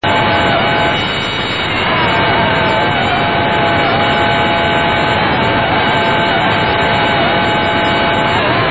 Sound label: beacon